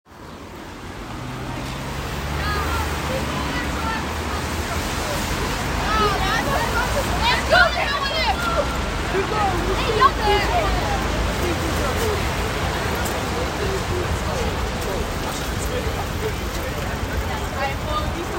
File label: Blaak intersection